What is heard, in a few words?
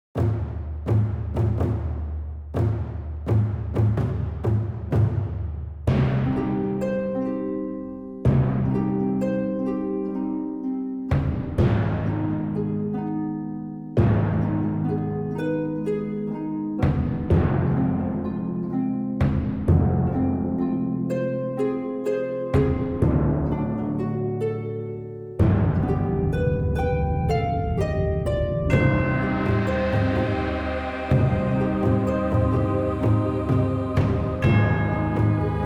circus; closure; ps3